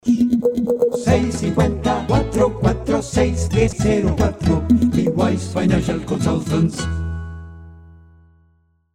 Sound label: SHORT FULL VOCAL